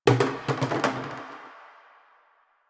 Plastic Hit

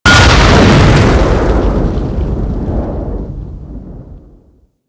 Metal Explosion; Explosions; Warframe

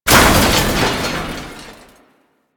grid door destructible; ambience; Warframe; Entrati

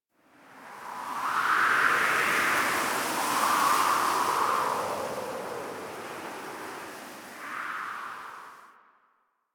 Wind Gust, Camp; Warframe; The New War; Drifter Camp

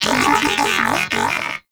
Moa Pet Enemy Spotted; Warframe; Tenacious

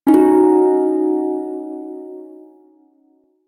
Prime Shawzin Yo Chord L; Music; Warframe

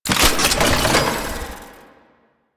Archgun Reload; Warframe; Archwing

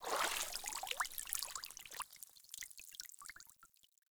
Water Shallow Stop Drips; Player; Entity Contact Movement

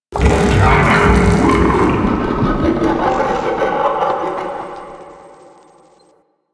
Search Lights Growl; Mission One; Warframe; The New War

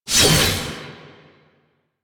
Scythe Whoosh; Melee; Archwing; Warframe; Weapons; Exalted Archwing Scythe

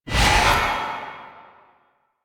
Void Dragon Baby Mine Boost; Enemies; Warframe; Duviri

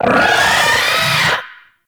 Arachnoid Berserk Vocal Attack; Enemies; Vocals; Warframe; Corpus; Arachnoid Hunger

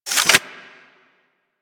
Arch Dual Pistol Reload Mech; Weapons; Warframe; Archwing

